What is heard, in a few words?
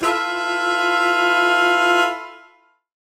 Horn Swell Alt Fmaj7b5; undercover samples